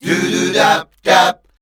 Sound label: Voice Spectral, H PHRS